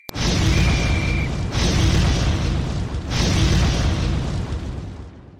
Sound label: aa sound